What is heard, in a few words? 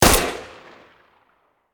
Hand Gun; Sound Ideas; Guns